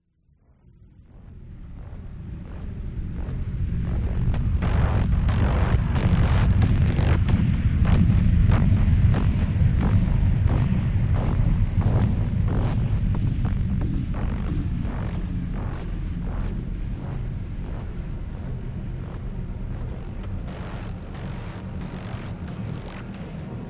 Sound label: sound design texture glitchy buzz pulsating dark; scott lawlor